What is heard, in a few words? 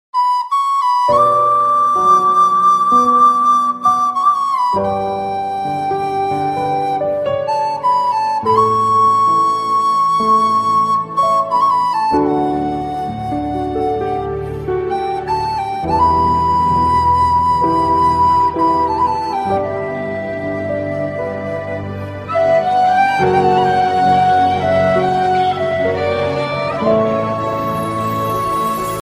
flute instrumental music ringtone